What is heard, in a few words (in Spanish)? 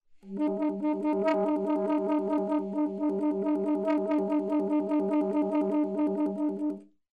Tremolo nota multif